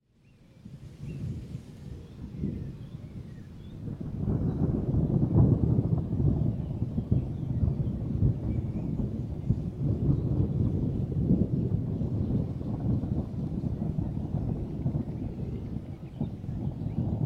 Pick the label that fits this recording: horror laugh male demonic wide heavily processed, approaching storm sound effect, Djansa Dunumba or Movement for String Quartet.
approaching storm sound effect